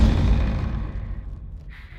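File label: Music Volcano 120; From Dust; PS3; Xbox 360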